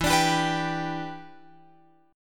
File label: E Minor 7th, down, Guitar, Open F; strummed